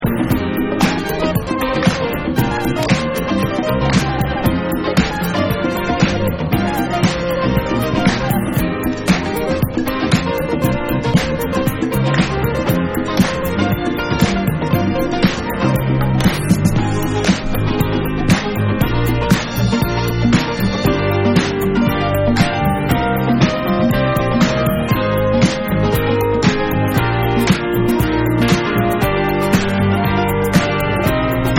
EARTH BREAK Instrumental

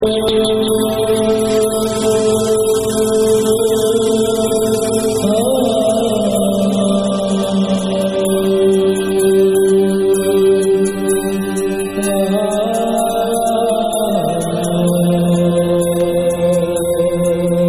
Ambient Mix

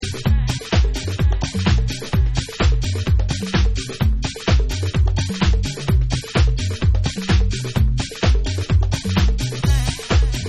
Beat

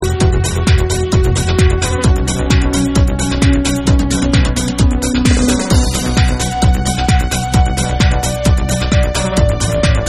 Instrumental Remix

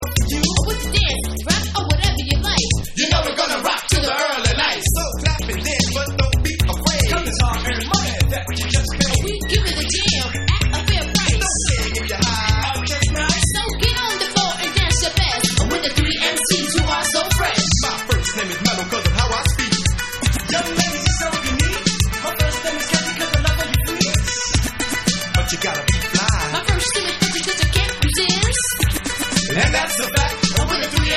Vocals

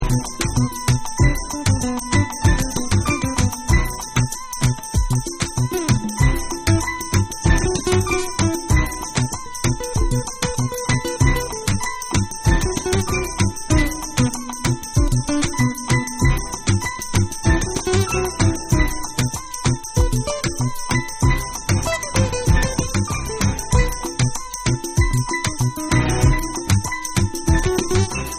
Latin Mix Instrumental

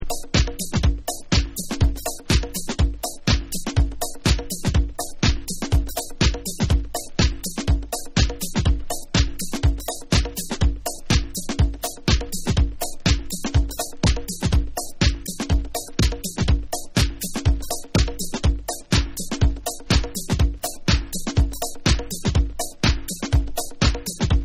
Bonus Beats

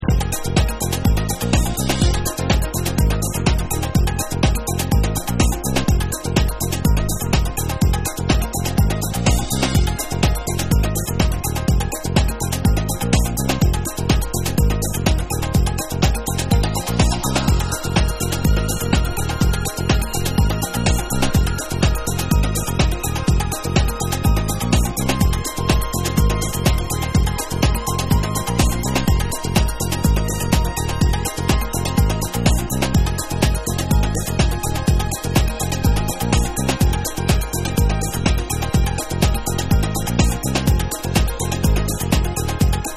Instrumental Version

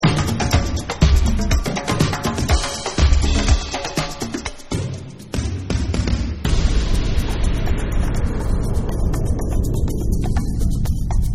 Dub

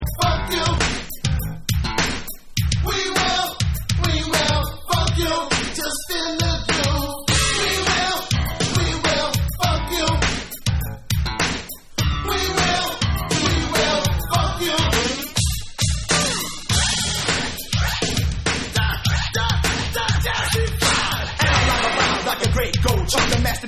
FUNK Club Mix

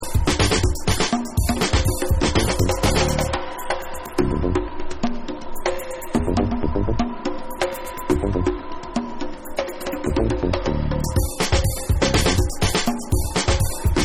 VOODOO DRUMZ

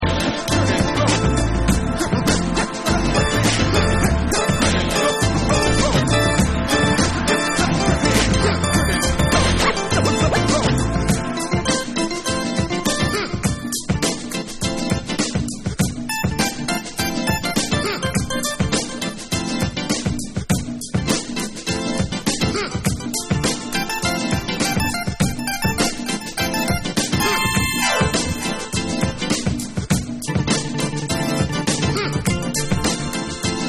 Organ Version

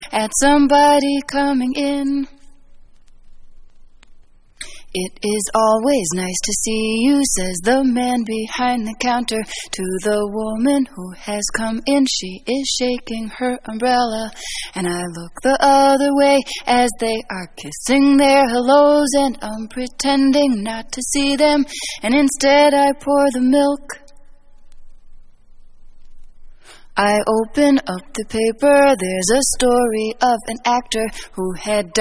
A Cappella

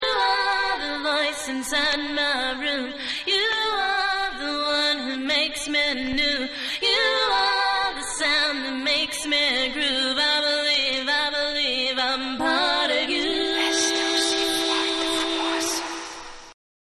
BEAUTIFUL OUTSIDE Acappella

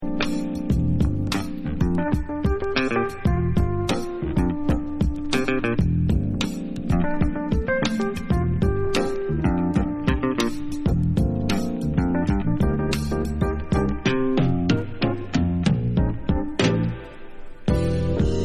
PARTIDO ALTO

